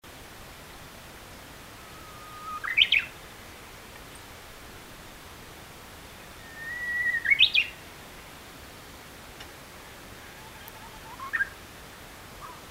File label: hatune, uguisu